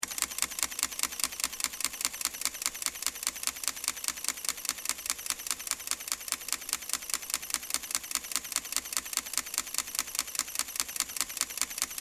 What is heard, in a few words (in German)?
Sony Alpha 7 Mark II Serienbildfunktion JPEG fein